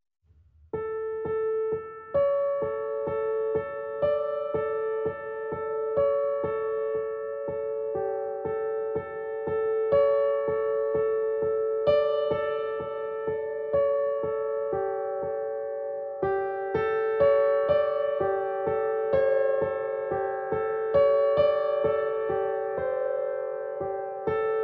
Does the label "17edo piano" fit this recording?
Yes